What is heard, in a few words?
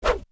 Fire Sword